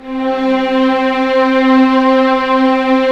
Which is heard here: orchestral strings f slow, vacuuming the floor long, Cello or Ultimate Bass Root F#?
orchestral strings f slow